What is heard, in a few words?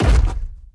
Enemy Footstep; bloom minigame